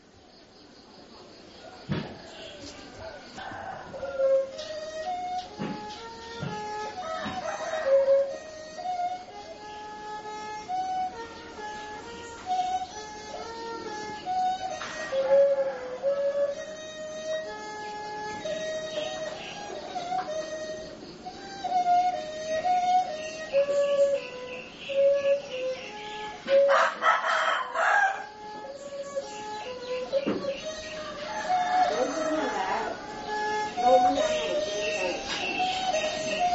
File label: saw